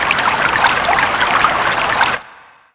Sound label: Flowing Water